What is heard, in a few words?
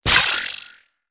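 staff, impact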